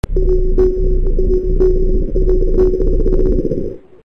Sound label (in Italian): suoneria botton; beep